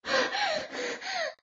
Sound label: Pant